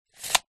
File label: industrial tape measure metal retract into plastic reel